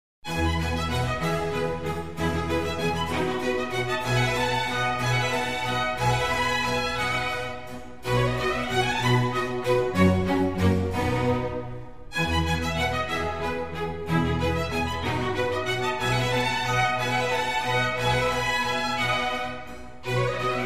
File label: Symphony for Strings in E major; RV131; Antonio Vivaldi